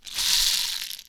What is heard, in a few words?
box rattle; Kenya Box Rattle